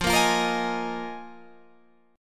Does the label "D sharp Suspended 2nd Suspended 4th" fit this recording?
Yes